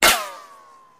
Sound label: Hit Bullet Metal